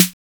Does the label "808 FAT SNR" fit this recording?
Yes